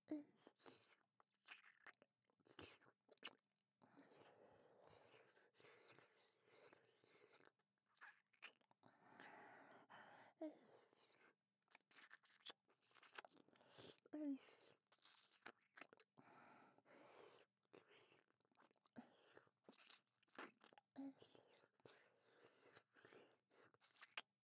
Dキス

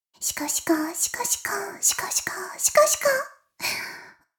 妹 しこしこ音声; おにいちゃんアイスぅー！

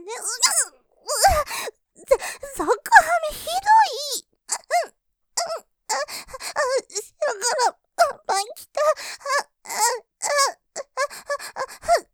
喘ぎ; ちんこ挿入！感じちゃう！即ハメ立ちバック！; ループで楽しむ！シェフの気まぐれ抜き抜きコース; モノラル

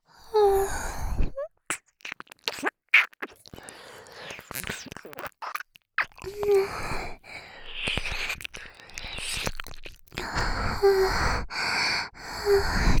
耳舐め 喘ぎ

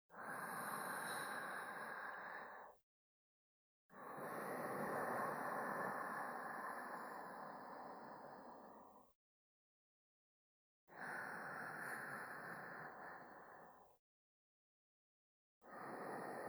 寝息ループ, ASMR

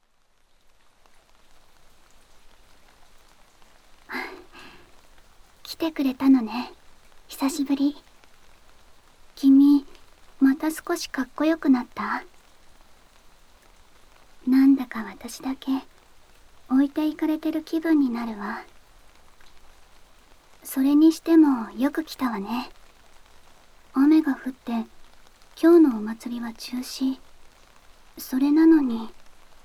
雨、降る縁側で耳かき, ASMR

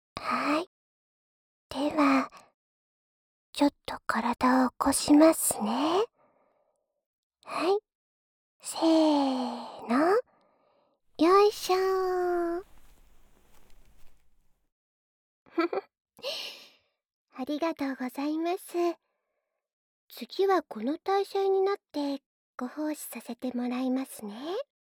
お耳ちゅぱちゅぱ; おち○ちんシコシコ♪

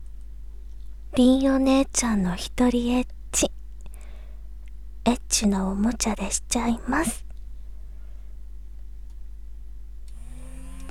生音バイブ入り、吐息無し。カウントダウン入り お姉ちゃんの一人エッチ。えっちなおもちゃでしちゃいます; 凛お姉ちゃん; BGM無し

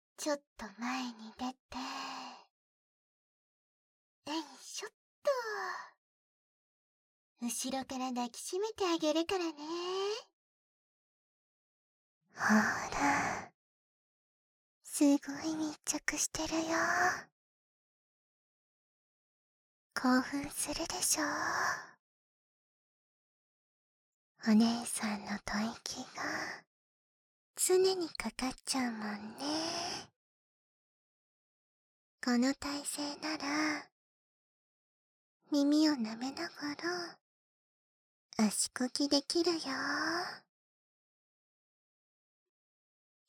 耳舐め 足こき

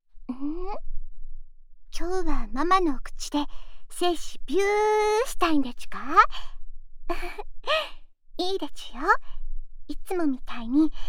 オナサポ用フェラチオループ, ASMR

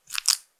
くちゅ音単発

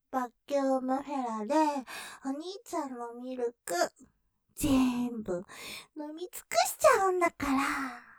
舐め ミルク飲み尽くしちゃるんだからｗ; ステレオ

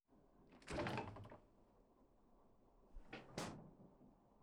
右耳から耳かきするよー。なんだか変わったサービスだよね, ASMR

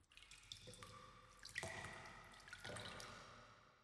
温泉(ぽちゃん)

